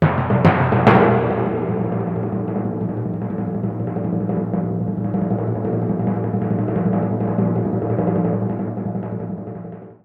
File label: timp roll